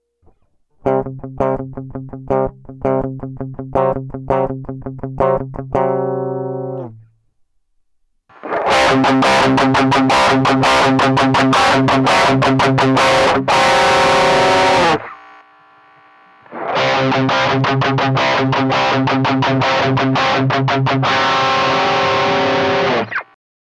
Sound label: Cab Sim